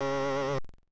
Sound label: Buzz; Charlotte's Web: Wilbur and Friends